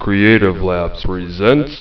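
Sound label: clap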